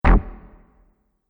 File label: Multimedia Button; Computing Noises; Andrew Fleming